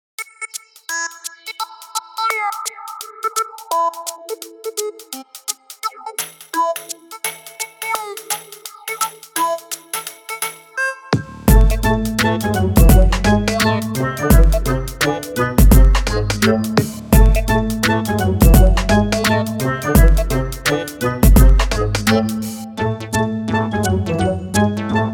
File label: duck loop